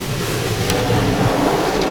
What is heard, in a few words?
Snare Drum; Percussion Samples